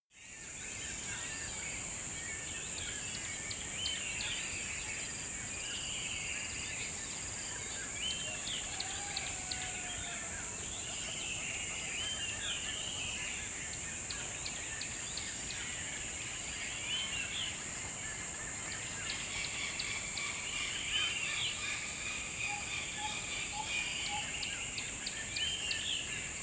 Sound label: river dawn calm